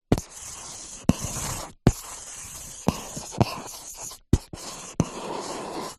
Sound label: office marker draw shapes whiteboard